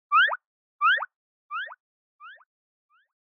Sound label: Anime Motion Low Delay